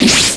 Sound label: ROCK